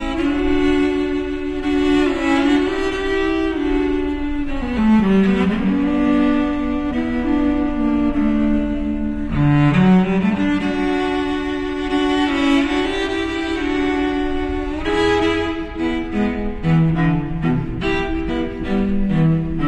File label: Misty Moonlight Waltz, cello